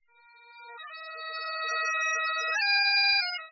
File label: bagp